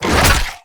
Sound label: creature rockpuncher chase